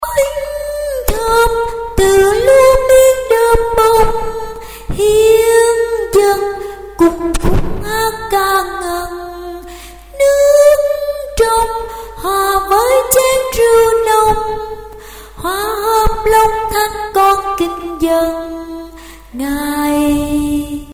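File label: soprano alto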